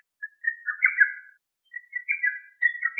Lesser cuckoo

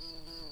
bumblebees, Lucorum queens

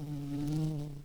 Lapidarius queens, bumblebees

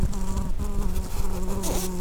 Lapidarius queens, bumblebees

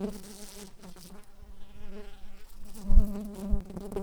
Pascuorum workers, bumblebees

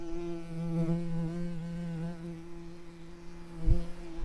Pascuorum workers, bumblebees

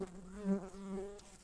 Humilis workers, bumblebees

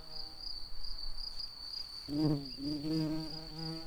bumblebees, Pascuorum queens

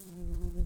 Humilis workers, bumblebees

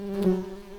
bumblebees, Hypnorum workers

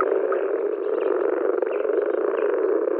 Moor Frog

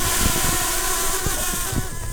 bumblebees, Pascuorum queens

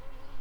Recording, bumblebees, Sylvarum workers

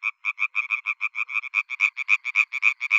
European Tree Frog

